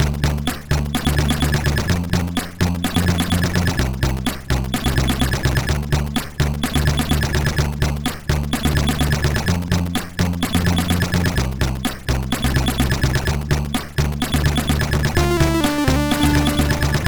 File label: MOS6581R4, sid